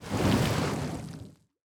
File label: submarines engines; World Of Warships